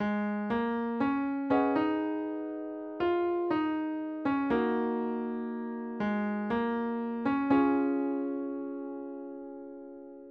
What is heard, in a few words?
instrumental